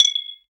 medieval stone collection; gameplay

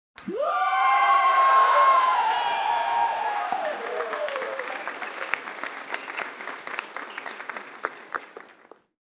studio audience erupts in, applause

